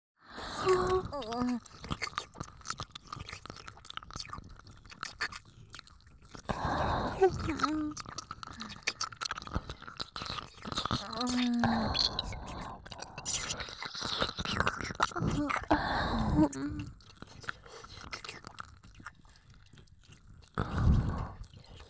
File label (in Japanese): キス＆耳舐め; 人妻×痴女; 淫語; オチンポおねだり～即ヌキ特化チン媚びバイノーラル～